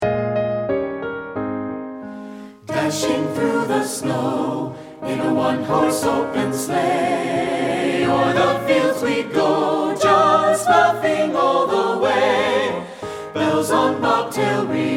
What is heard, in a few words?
JINGLE BELLS SATB; partbypart